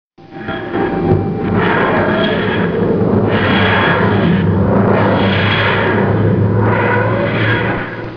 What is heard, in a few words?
TARDIS